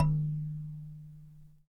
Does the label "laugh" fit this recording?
No